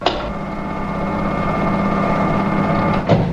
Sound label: elevator automatic doors